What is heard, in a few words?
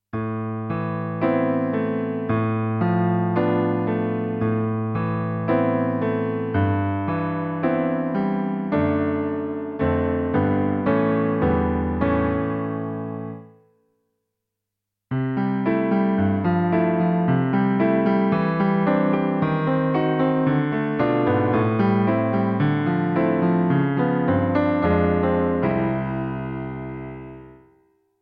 karaoke